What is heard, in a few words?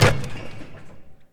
Basketball Dunk